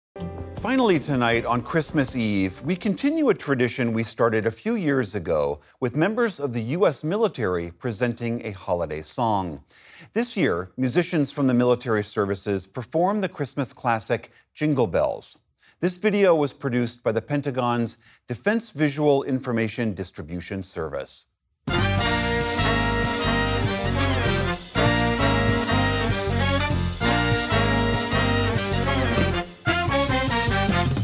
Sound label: jingle bells; pbs newshour segments